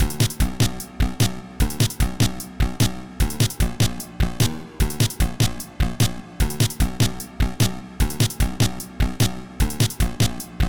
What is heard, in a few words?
Pause Music